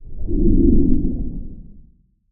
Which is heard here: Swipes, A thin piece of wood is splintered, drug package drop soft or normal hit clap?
Swipes